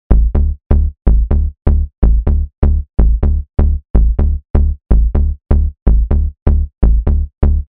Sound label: bass